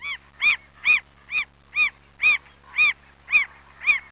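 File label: forest bird; DAoC; Toa; Gamestar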